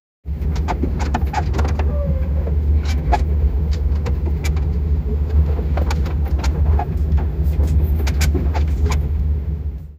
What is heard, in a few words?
dark interior of an old; cinema